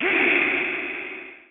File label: attack; Dark Eden Beta Test